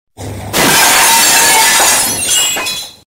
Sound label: glass breaking earape